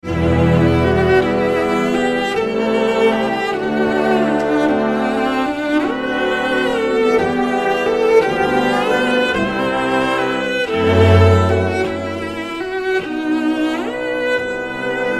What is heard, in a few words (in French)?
concerto pour violoncelle, andante; ELGAR (Edward)